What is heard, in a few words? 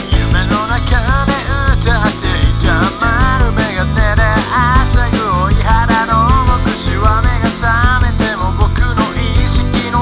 beats